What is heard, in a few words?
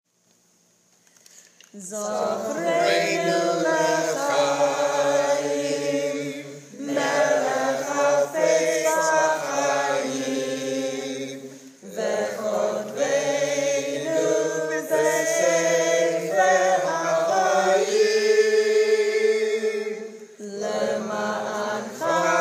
Zokhreinu